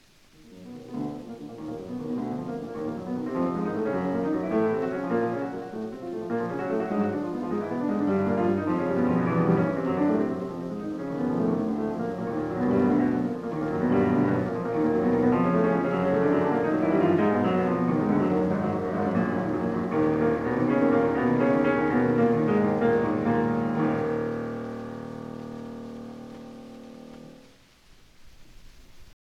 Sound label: Prelude in E-Flat Minor